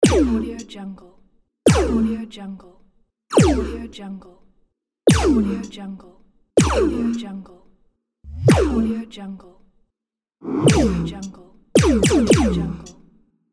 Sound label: laser